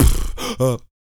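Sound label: vox percussion, Vocal Planet Jazz FX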